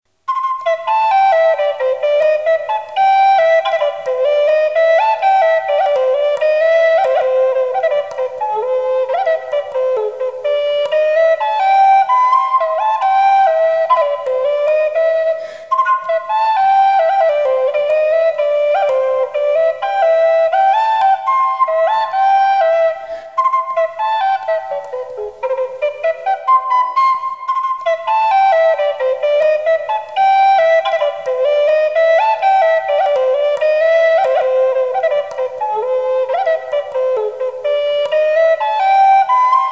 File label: Dance Flute Fingers